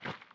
Step; Alexander

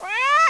Hit; Mutant Dungeon VR

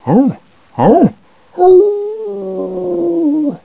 howl; dogz; Power Pack Spiele 2

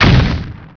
Magic Missile Hit; Heretic II Demo

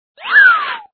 transition

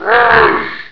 goblin hurt; Ferazel's Wand Demo

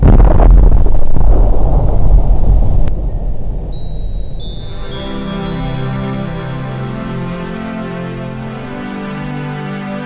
EARTH XPL; Sportware Golf

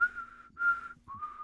whistling; The Sum of All Fears; English Voice

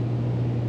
vent

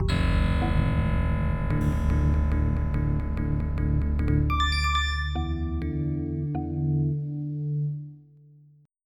recharge; Flashback Demo

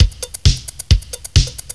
loop; Descent

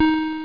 BLIP DOWN

